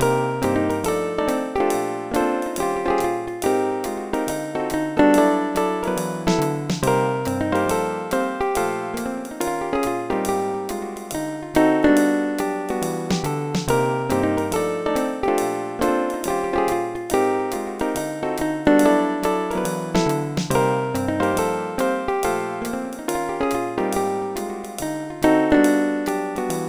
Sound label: solo; Dr. T's MIDI Recording Studio